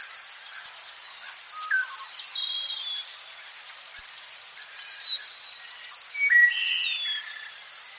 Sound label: ritual chanting; Horror